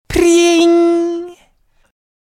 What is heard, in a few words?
Priiiing